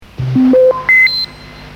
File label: Superbeep; Max Headroom; The Max Headroom Show